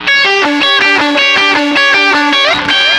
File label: 160 Garage Guitar Lead Em; Dance DJ